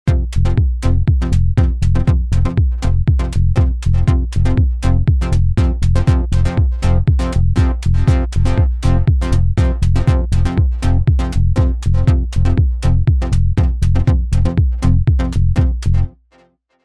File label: SUBTRACTOR MINIMAL